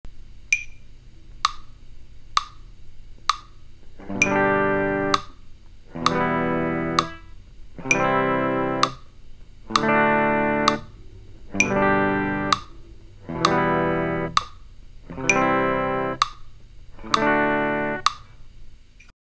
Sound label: Chord switching half notes